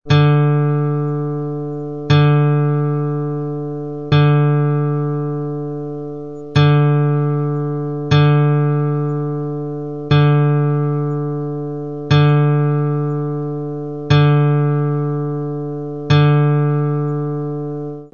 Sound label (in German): Stimmen D Stahlsaite